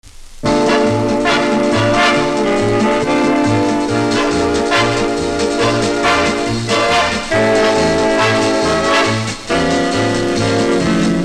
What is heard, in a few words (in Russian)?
Оркестр